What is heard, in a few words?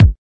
kik, kicks